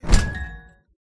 Land; Garry's Mod; boxy boo v2; gift boxy

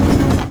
cart rolling stop; Garry's Mod; tf2

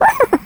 skelly small

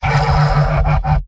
laugh evil; pyro; tf2; garrysmod; mvm

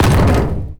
sentry buster step; Garry's Mod; tf2; mvm

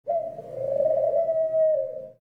dove; ambient; Garry's Mod; tf2; rottenburg

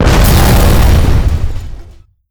giant soldier explode; Garry's Mod; tf2; mvm

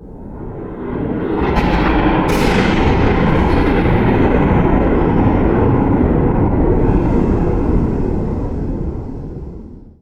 thunder, halloween ambient; Garry's Mod; tf2

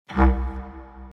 Footstep; Saber; sabernet

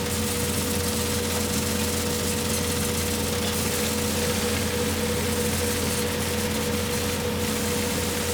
computer tape; Garry's Mod; tf2

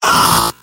scout pain sharp; vo; tf2; mvm

